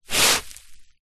footstep single scuff scrape barefoot on foam exercise mat; zapsplat; foley